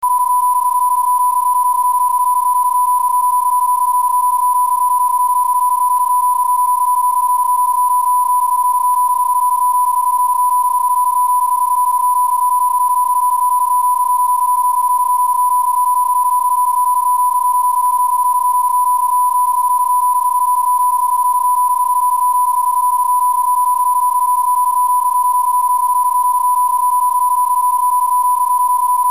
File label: bpsk31